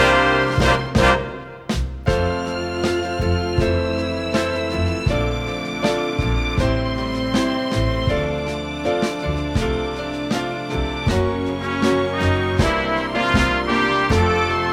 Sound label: Audition Cut Instrumental